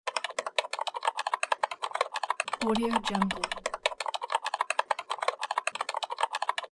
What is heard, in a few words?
keyboard typing sound; alexsandal